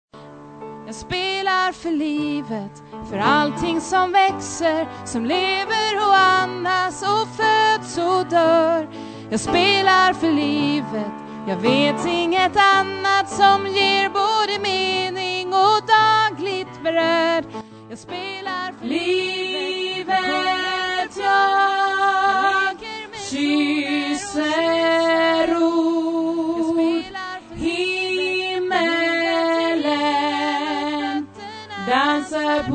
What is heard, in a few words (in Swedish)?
alt, stammor